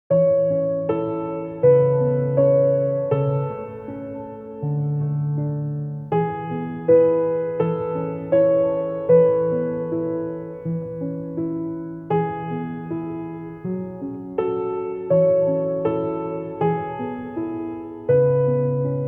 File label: Soulful Piano